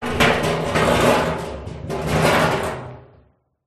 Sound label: metal oil drum empty drag concrete ground; foley